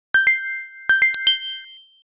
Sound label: timeout; nordi shootingrange